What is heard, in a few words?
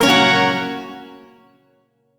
Shawzin Hirajoshi Chord; Music; Warframe; Duviri; Chords